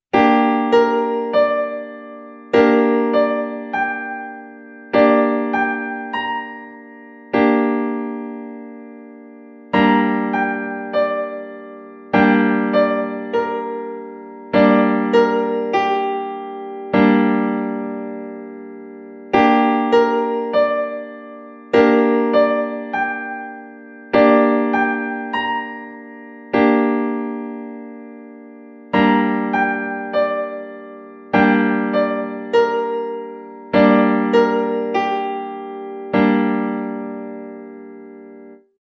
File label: Gm Piano Keyboard Chord